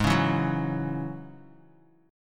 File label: Ab 7th Suspended 4th Sharp 5th down Bass Standard; Ab7sus4#5 strummed